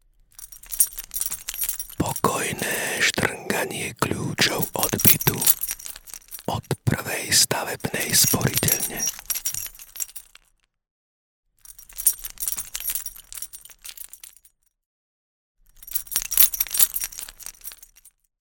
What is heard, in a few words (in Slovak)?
pokojne strnganie klucov od bytu